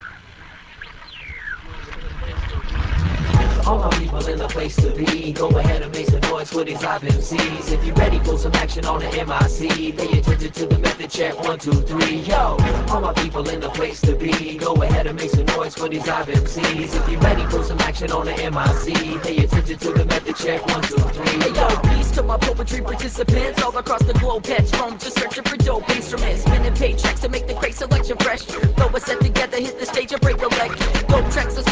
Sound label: NL Funk